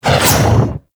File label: Scythe Whoosh; Warframe; Weapons; Stalker Scythe